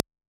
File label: Snare, 606; classic machines